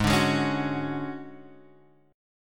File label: D Major 7th Flat 5th Ab, chord